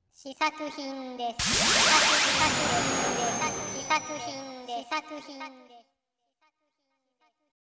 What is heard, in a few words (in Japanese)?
効果音